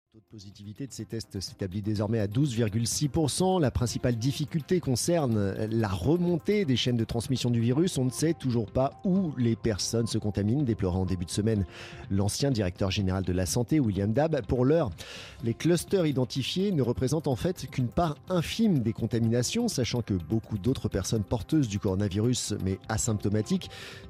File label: Clusters